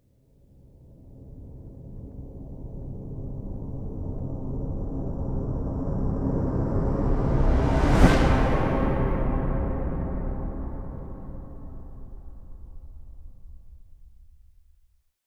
Whoosh Transition Trailer Sci Fi Orchestral, Whoosh Transition Vocal Elements Orchestral Slow Build; Whooshes; Sound Design